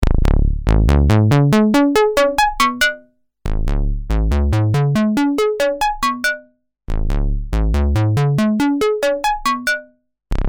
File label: Model D; Model-D-Vergleich